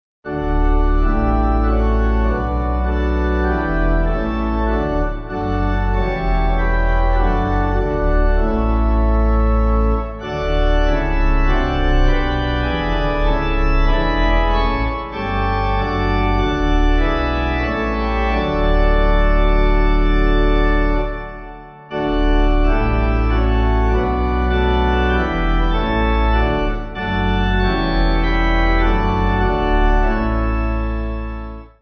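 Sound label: Pipe, Pipe Organ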